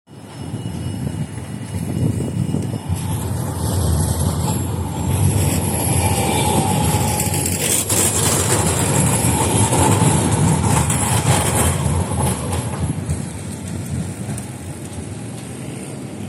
tram passing by, Rotterdam Field Recordings

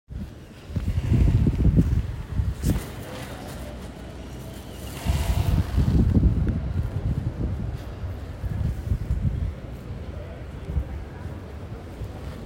Street + wind, Rotterdam Field Recordings

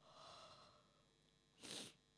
yawn and sniff

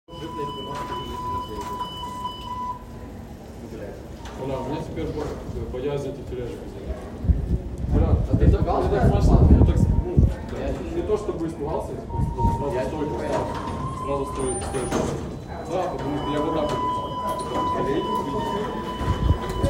Coolhaven + rain + thunder, Rotterdam; Rotterdam Field Recordings

